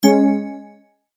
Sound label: Multimedia Tone-Stop